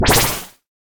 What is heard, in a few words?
whoosh